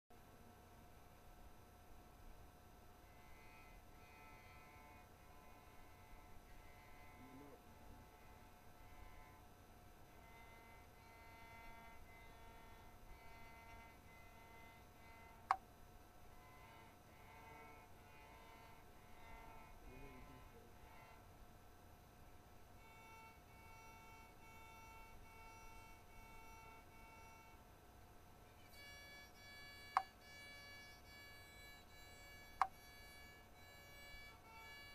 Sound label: violin